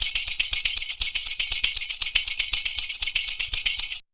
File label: PERCU; Univers Sons; Raricussions